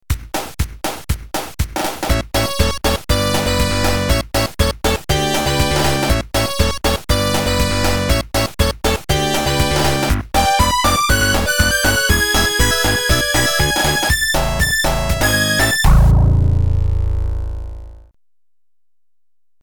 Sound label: ending bgm; sonic1